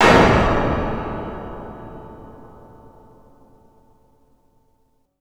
Clanger; Zero-G; Total Drum Bass

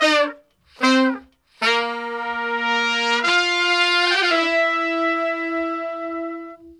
BRAZ LICK, BRASS LICKS

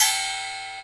Reco Reco Open; EMU Planet-Earth

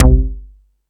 Dance 2000, Drum Machines E-MU Pro-Cussion

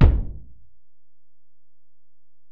Ac BD, Alesis DM-PRO; 300 Drum Machines

